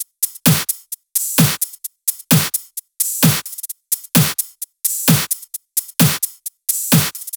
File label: 130BPM Kit; VFH1 130BPM Tibet Kit